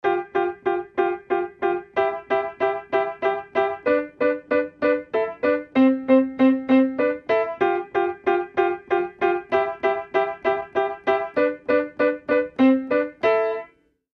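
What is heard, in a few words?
Piano Play Chopsticks; pianos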